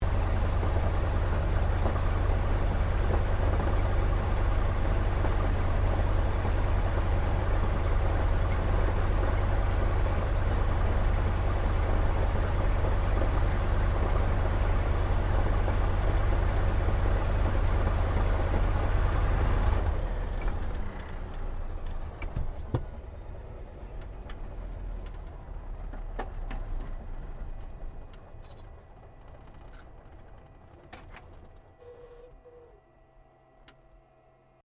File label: AIRCRAFT T-28D TROJAN TAXI SHUTDOWN INTERIOR; Airplanes (Sound Effects:Vehicles)